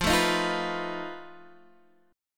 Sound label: F 7th Flat 5th, Guitar Standard; F7b5 strummed; 13,12,13,10,12,x